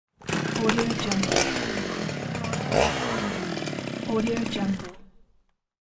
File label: chainsaw start and stop